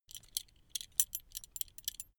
metal belt buckle clatter long soft

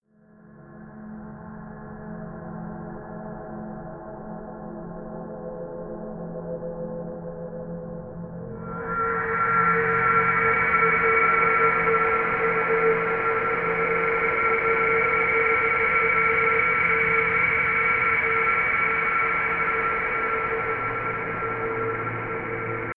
new world pad

